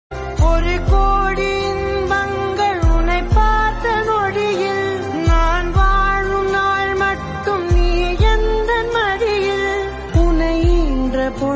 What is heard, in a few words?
tamil